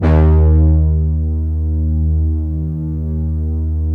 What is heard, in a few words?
HORN, MATRIX 12; Big Fish Audio - First Call Vintage Keyboards